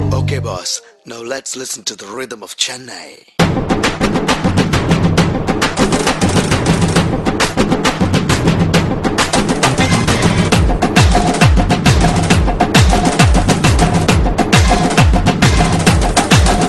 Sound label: Rythm